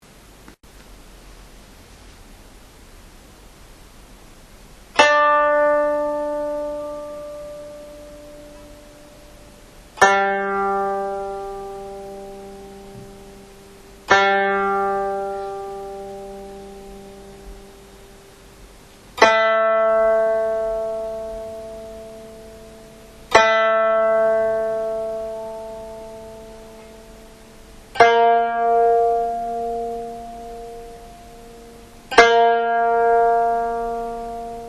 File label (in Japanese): 壱越の平調子, 箏 調弦; D G A A# D D# G A A# D D# G A; ichikotsu hira